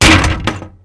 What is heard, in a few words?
metal; Mafie 1